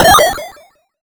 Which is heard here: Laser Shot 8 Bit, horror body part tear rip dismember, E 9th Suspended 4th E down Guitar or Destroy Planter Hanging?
Laser Shot 8 Bit